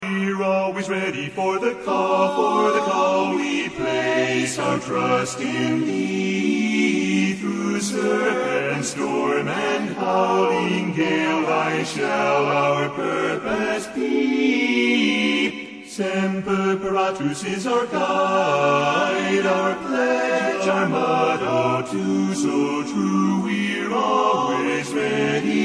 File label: armed forces medley Coast Guard bass